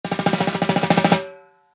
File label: drumroll; transition sound effects